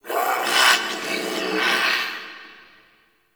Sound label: Sentient Lair Interior Creature; Warframe